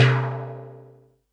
tom, Pearl SC-40 Toms

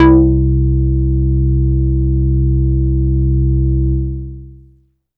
MOOG PONK, Synths

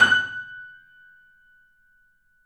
The Piano F#; InVision Interactive - The Piano

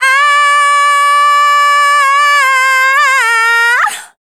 FEMALE, Dance Vocals

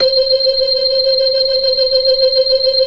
Blood, organ; Keith Emerson Signature Series

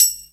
Tamb, Programmed Stepz, drum loop hits; Zero-G Jungle Warfare 1, 2 & 3; Percussion Hits

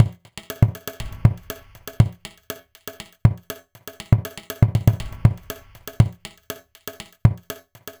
Ethnotronic, Processed Percussion

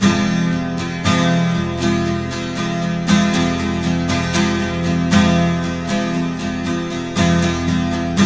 12 STR MIN F#, TWELV; Spectrasonics; Hans Zimmer Guitars VOL-1

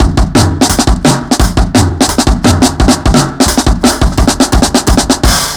Brown Dirty 4 Bar, Drum n Bass Beats; Beats and Percussion; KJ Sawka; Drum n Bass & Beyond

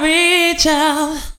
reach out, female vocals; PlatinumPhattCD2; E-MU Producer Series Vol. 8 – Platinum Phatt

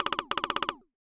woodpecker, cartoon; Classic SFX; musicradar; cartoon caper

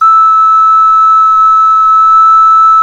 CLAYFLUTE, Heart of Africa

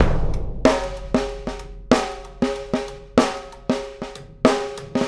Big Drum and Snare; Sonic Foundry (Sony Creative Software); Essential Percussion; Drums; Big Drum Combos

